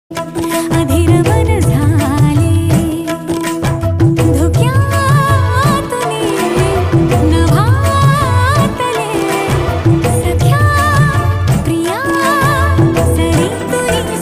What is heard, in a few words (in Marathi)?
marathi ringtone marathi love ringtone